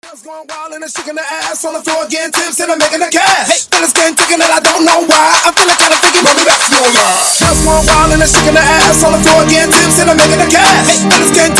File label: Mixshow Clapella In Starter 130 BPM